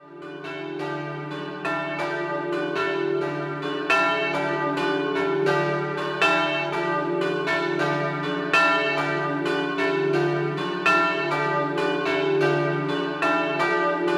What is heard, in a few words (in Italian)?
Doppio, campane